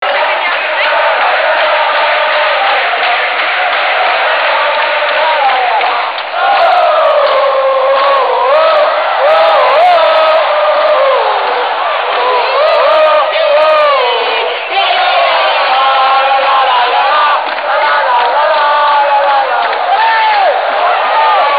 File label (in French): Lalalaohohoho, chants